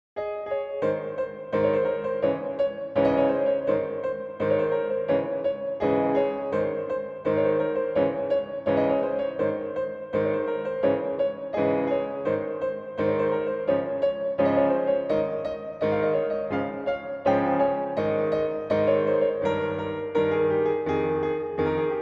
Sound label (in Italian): minuetto